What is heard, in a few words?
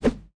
staff swing